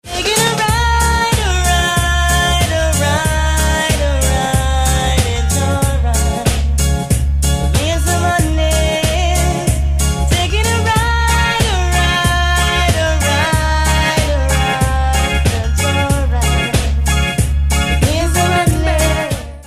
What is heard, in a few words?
Reggae